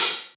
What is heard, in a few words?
Open Hihat, Cymbals; Vengeance Samples